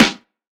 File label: low snare sound A key, snare tuned to the A note, Loudest frequency: 1826Hz